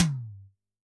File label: Tom, drum